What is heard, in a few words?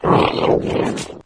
melee; halo; flood